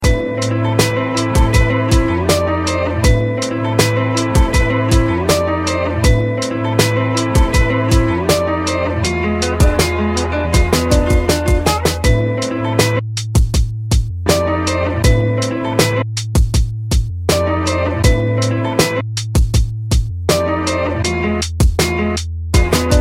Hiphop Beats

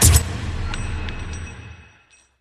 Silencer

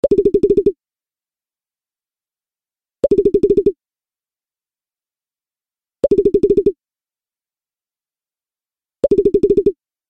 bubble ring

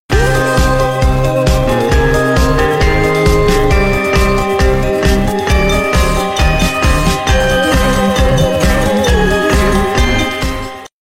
Spooky halloween mix

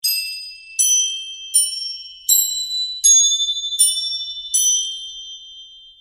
Bells 3d

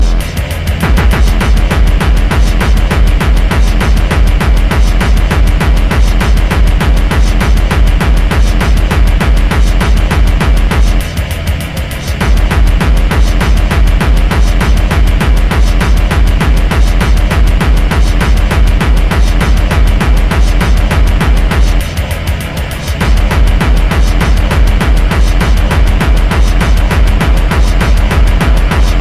Holy Noises

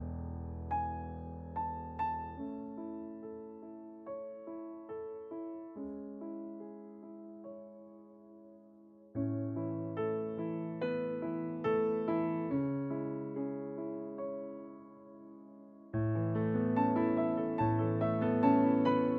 piano

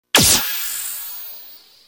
Laser; SSBB

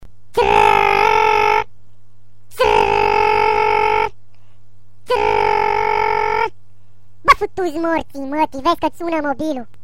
Funny Ringtone

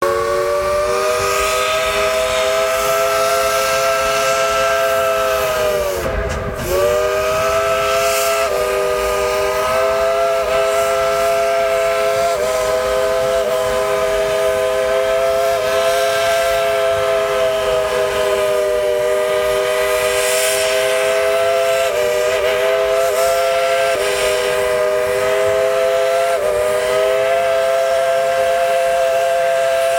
Train Whistle

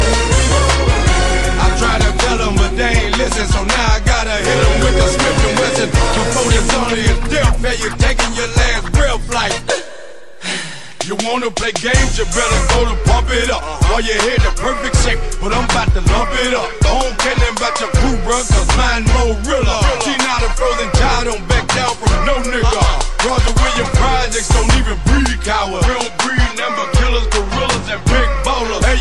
Last Breath

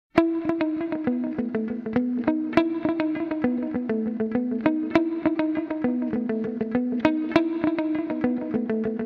Message Tone

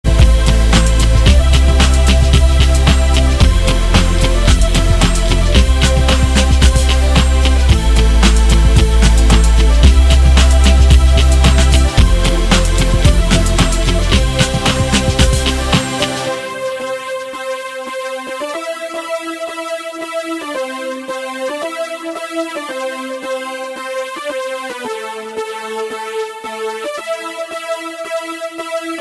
Relax Alarm

